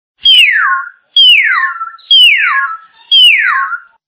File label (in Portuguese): sons de passaro maina